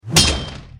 strike; New Yankee in King Arthurs Court

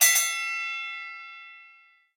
alarm; Rescue Team3

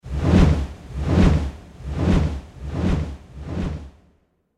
mill; New Yankee in King Arthurs Court

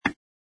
metronome; Agatha Christie Death on the Nile

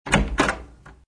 room enter; Agatha Christie Death on the Nile

